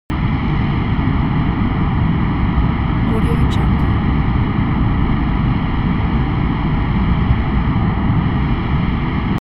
transportation jet military b52 stratofortress turbine steady; distant